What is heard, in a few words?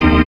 chords